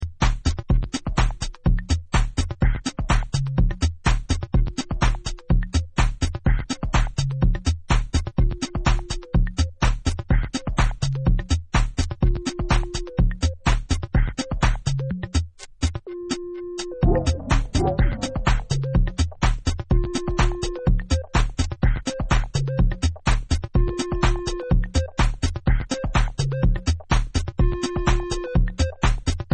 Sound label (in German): glocken rocken